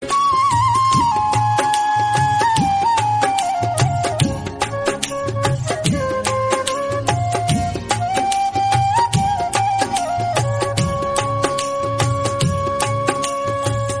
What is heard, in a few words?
flute pakistani, ringtone